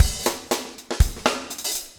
breakout 120, 120bpm, loops

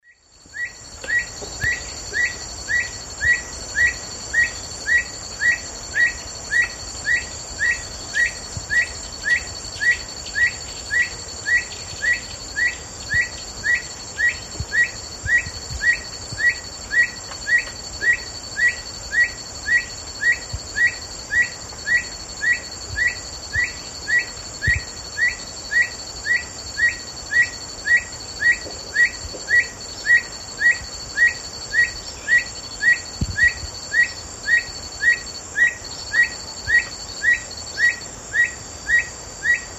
Neoctantes niger, song; S. America; Eastern Lowlands

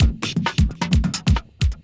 Drum, Breakbeat

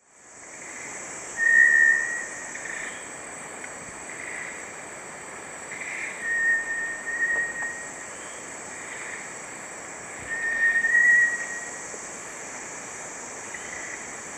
Crypturellus bartletti, calls; S. America; Eastern Lowlands

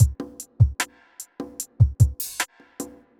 evil beats

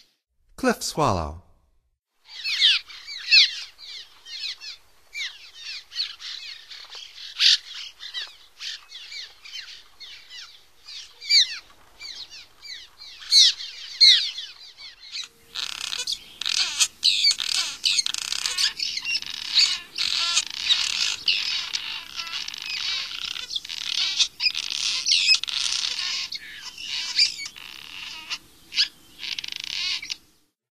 Cliff Swallow, Songs of Birds of North America; Stokes

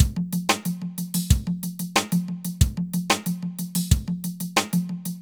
conga hop 92

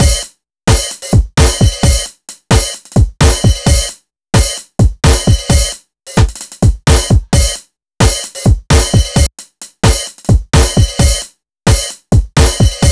Drums, Rock

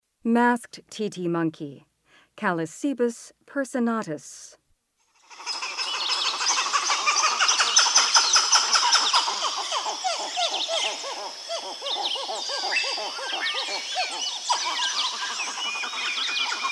Masked titi monkey; S. America; Rainforest; Primates of the Rainforest

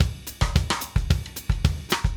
Drums, Jazz

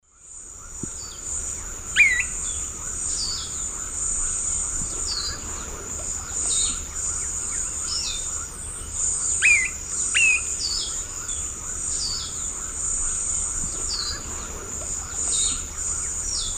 Stigmatura napensis, calls; S. America; Eastern Lowlands

